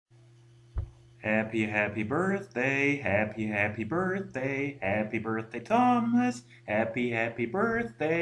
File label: Happy Birthday Song